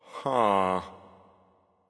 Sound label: grunt seriously; bacchus